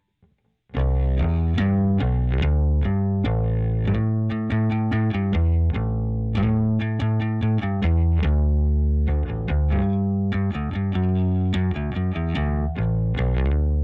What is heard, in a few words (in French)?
bass avec omega light